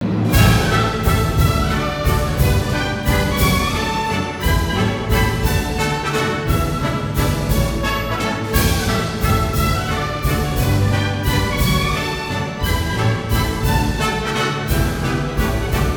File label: Mazurka; Léo Delibes; Mazurka from Coppélia